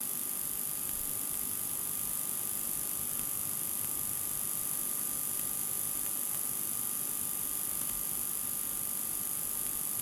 Vhs Video Tape Playback Noise Tracking Mode Through The Speaker